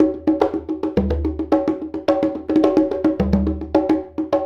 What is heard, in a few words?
Congas; Essential Percussion